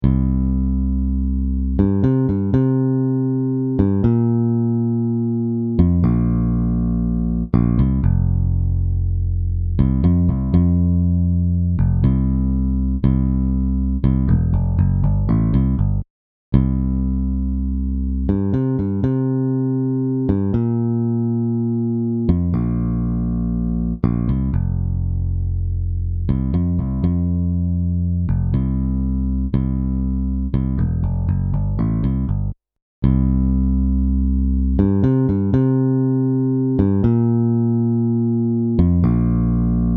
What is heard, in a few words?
Bass